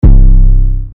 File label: 808s; AUDIODE; EPSYLON; epsylon drum kit